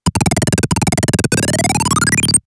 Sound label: flute